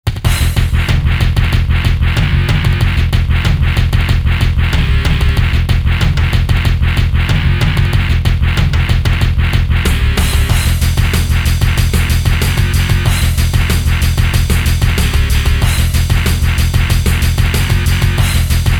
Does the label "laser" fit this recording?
No